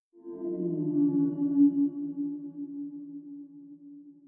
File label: whale spawn; zoochosis